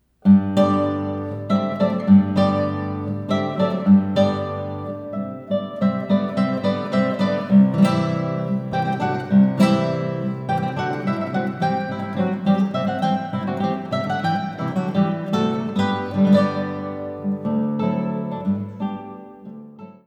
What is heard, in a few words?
mazurka